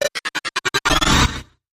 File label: Short Sputter